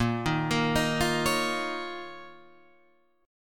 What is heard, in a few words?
Eb 7th Suspended 2nd Bb